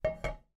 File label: frying pan place down on stove very gently